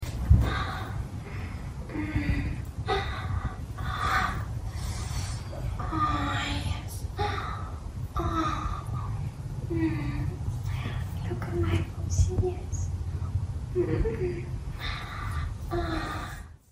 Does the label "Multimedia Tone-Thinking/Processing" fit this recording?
No